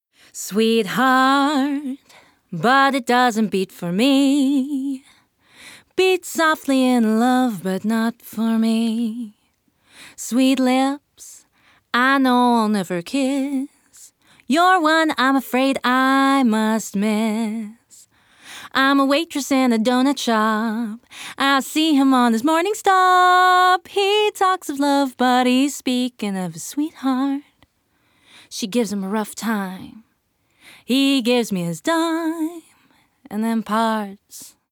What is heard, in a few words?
SINGING